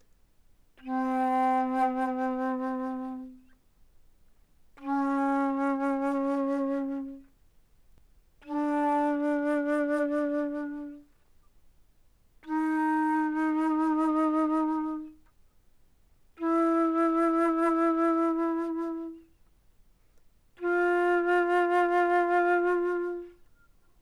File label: Alto Flute mf C4 B4, woodwinds